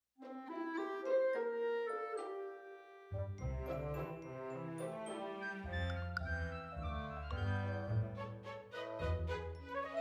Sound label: Snow Theme Bgm; My Little Pony Magic Princess